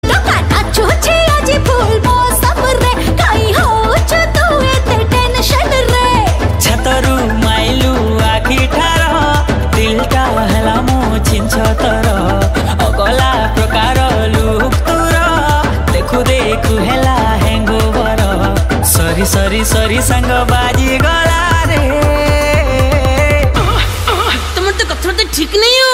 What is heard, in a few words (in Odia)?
Markundi Song Toka Nachuchi